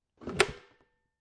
Household, Cooler Close, Small Plastic Cooler; Foley, Container, Plastic; Lid Sound Effects

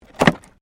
WOOD PLANK BREAK

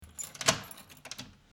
old fashioned key in lock, Skeleton Key In Lock; Old-Fashioned Skeleton Key In Door Lock; Objects

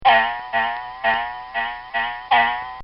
Comical Sound Series Bong Spring; Funny Sounds; Bobby Cole

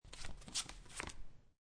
Playing Cards Dealing Three Cards Flop; Casinos

